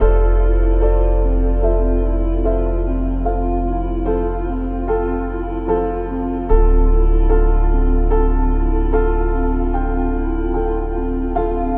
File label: Full Dm 74, Lo-Fi Kits; HipHop; Melody Kits; Home